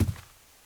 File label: carpet step, Footsteps; civ13; civ14